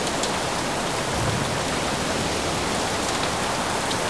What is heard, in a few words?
rain